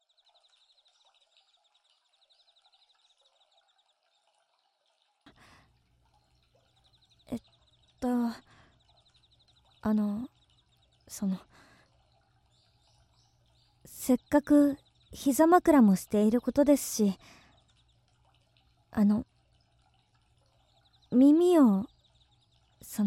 えっちな耳舐め, ASMR

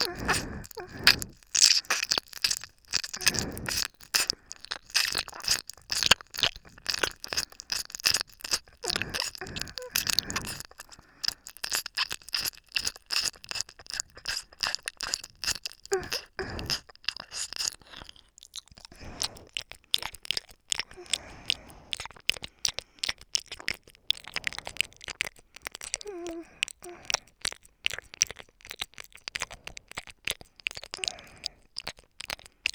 左耳深め＋吐息

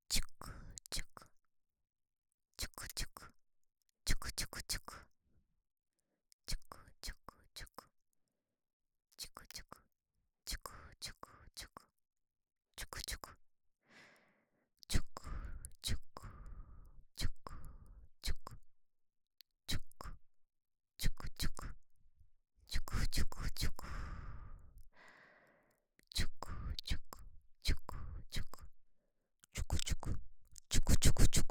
ちゅくちゅく, ASMR

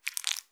深めにストローク, ピストン運動 激しめ

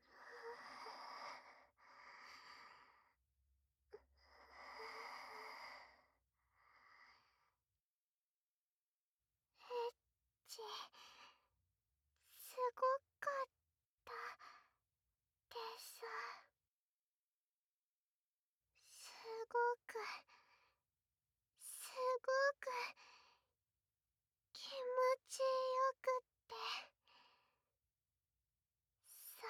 耳舐めご奉仕セックス; 本番

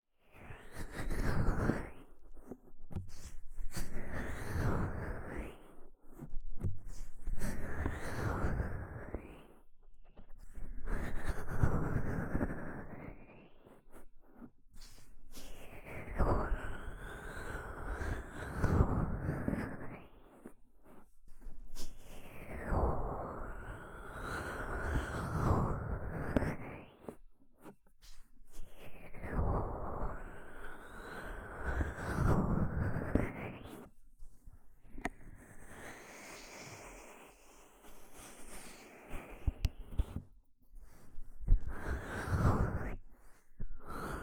耳マッサージ右; 音フェチさんのための効果音抜粋 vol.1

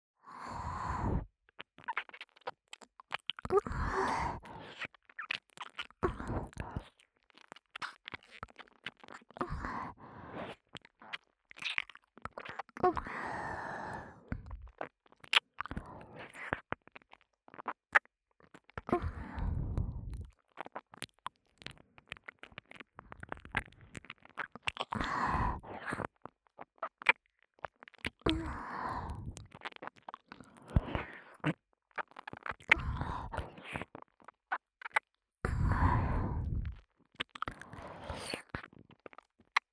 (右)耳舐め 優しくキス; 耳の奥底で蠢いて～舐め音に溺れるバイノーラル～; 舐め音特化

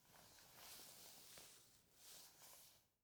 衣擦れ左へ; 動作系

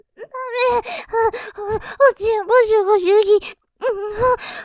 喘ぎ; ダメェ。おちんぽすごすぎ; ループで楽しむ！シェフの気まぐれ抜き抜きコース; モノラル

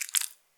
膣内射精前, 引き抜かれた瞬間; 挿入or引き抜く

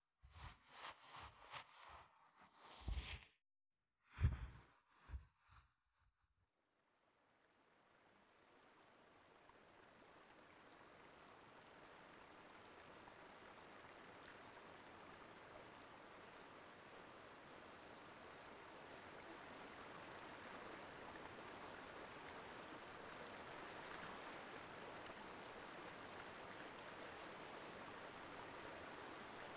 ASMR 密着爪切りリラゼーション

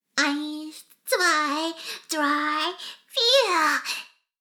しこしこ音声; 少佐殿; 牧場戦腺

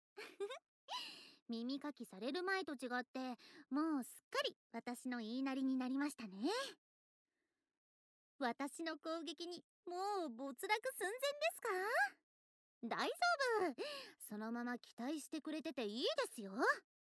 オイルでお耳をとろとろマッサージ（オイル音）, ASMR

